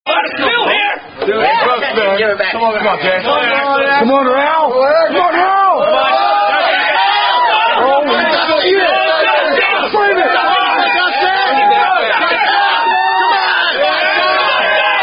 drinking contest; Wou Rugby Team Loses In Boat Race

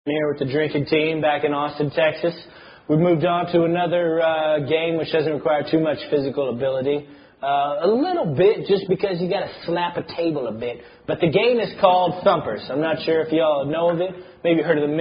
Drinking Games Playing The Drinking Game Thumpers

